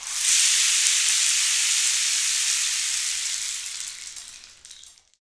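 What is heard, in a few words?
percussion rain stick; Roland - Rhythm Section; Water Perc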